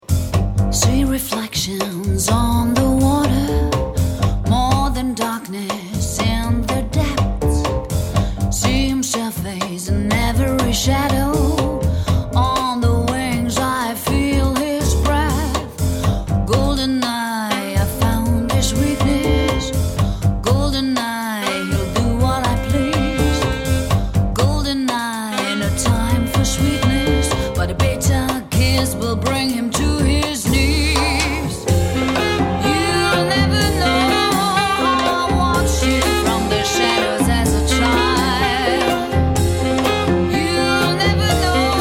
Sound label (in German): gesang